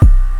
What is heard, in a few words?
Kick Hum, Drum Machines; E-MU MoPhatt